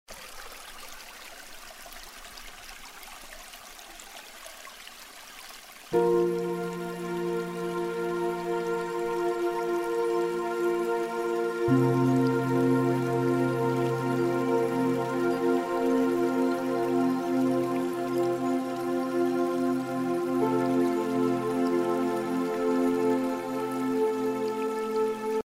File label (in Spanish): Musica para meditar